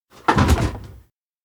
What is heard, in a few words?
kick gear; fumiya; anemoi パッケージ版 1.1